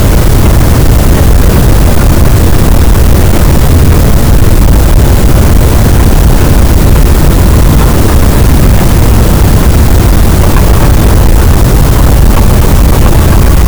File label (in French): cachalot, gaussian noise; Physeter Macrocephalus